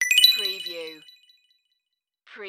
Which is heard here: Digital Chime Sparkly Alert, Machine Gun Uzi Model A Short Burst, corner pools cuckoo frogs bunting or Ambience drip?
Digital Chime Sparkly Alert